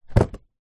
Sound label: foley cardboard box small down on carpet